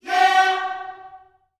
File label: "yeah" F#4, Gospel; Spectrasonics; Vocal Planet Vol.1 Gospel; akai